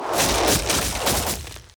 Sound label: Ice Barrage; Spells; Free Fantasy SFX Pack By TomMusic